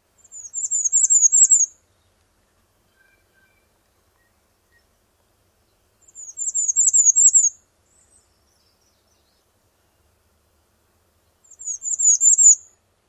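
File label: songs number 2, warbler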